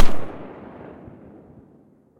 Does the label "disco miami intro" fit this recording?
No